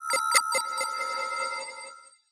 Computer Scan Detect, stinger

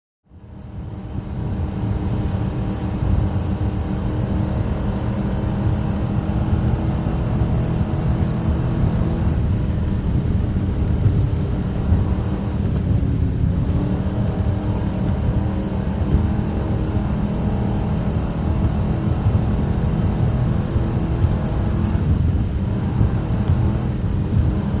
Power Boat Int Racin, off shore power boat

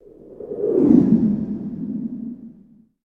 Stinger Sci Fi Whoosh

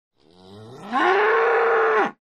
Bull Roar, Medium Perspective.; cattle

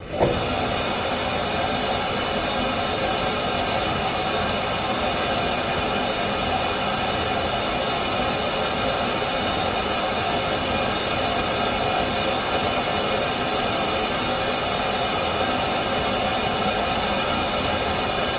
Water Fountain

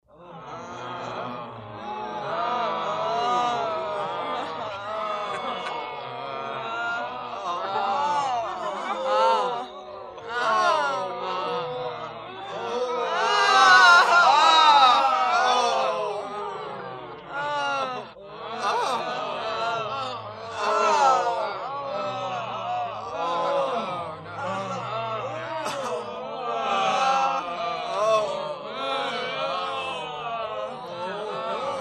Grp Moaning Weary

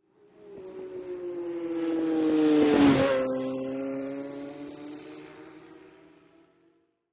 Motorcycle Road Racing

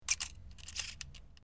Camera Clicks Wind; shutters & movement

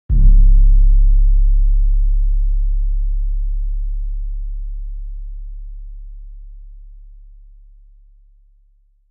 LFE Electronic Hit with Tremolo, LFE

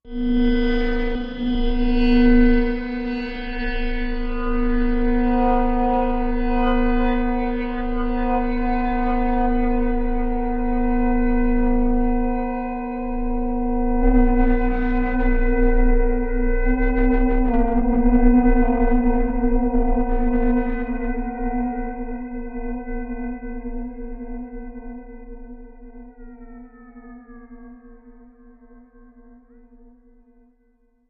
Stress Creator Machin, sci-fi ambience

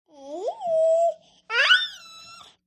Baby Highpitch Play, nineteen month old

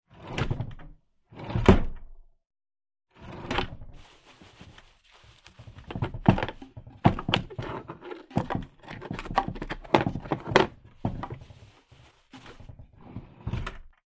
Dresser Drawer

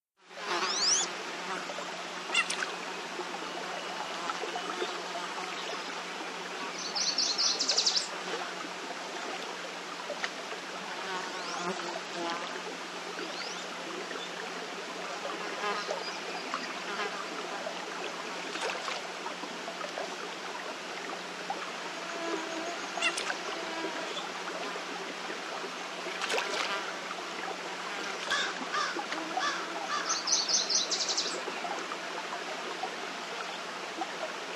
Swamp Bullfrog Cal

